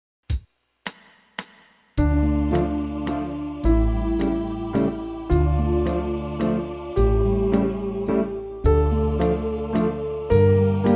Variations On The D Minor Scale Slow

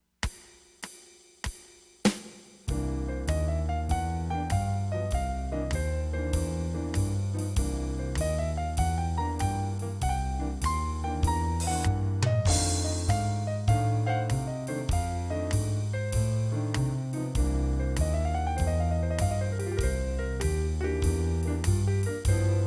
The Walking Bass

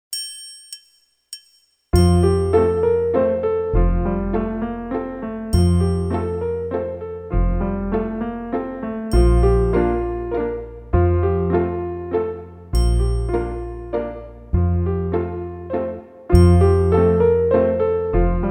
Allegro In F Major Slow, piano; Beyer

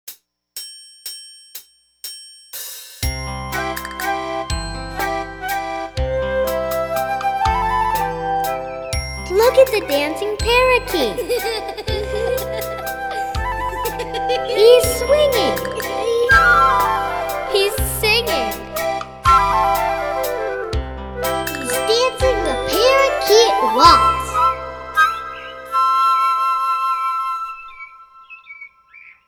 Waltz

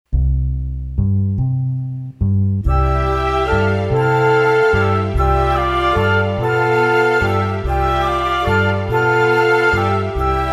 Barcarolle Rocking Chord Accompaniment

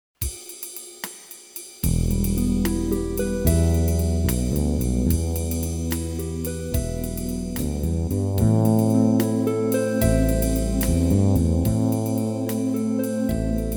E Minor Arpeggio Study

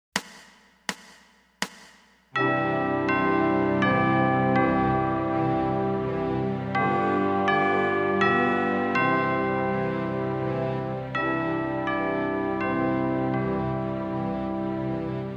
Clock Tower Bells

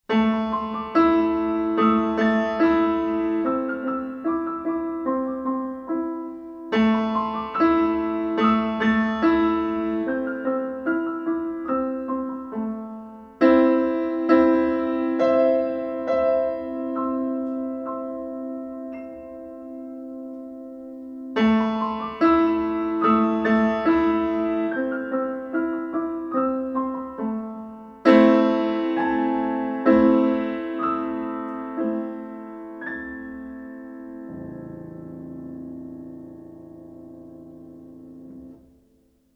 piano

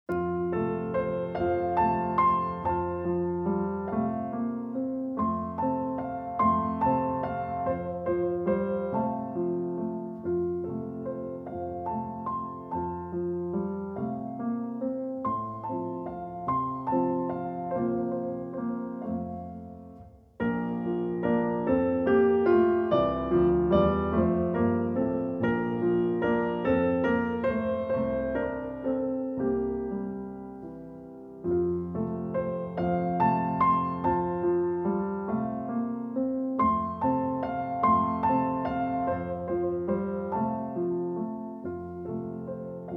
piano

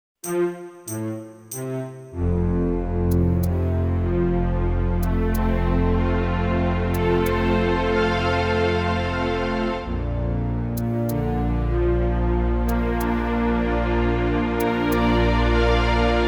Dotted Quarter Arpeggios

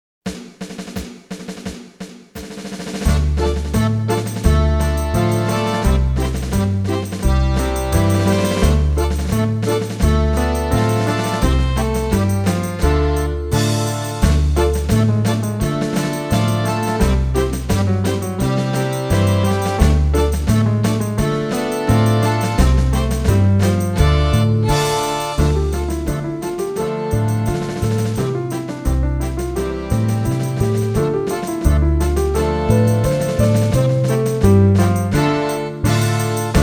Sea Chantey Slow, piano

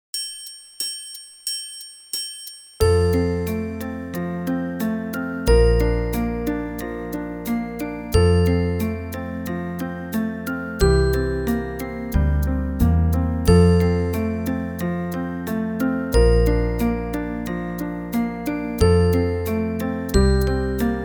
Alberti Bass Study Slow

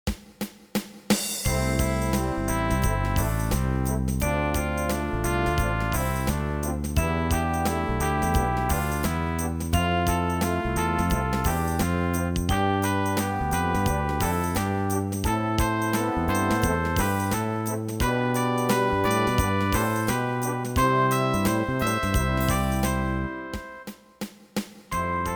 Rhythm Study

